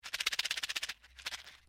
RATTLE SEEDPOD LONG, Musical rattle made from seedpods